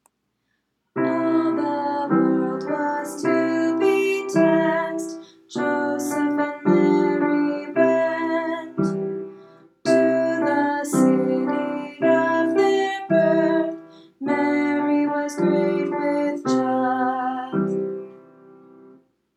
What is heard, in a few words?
recitative